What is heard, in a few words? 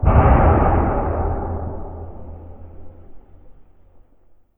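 underground metal; blackforest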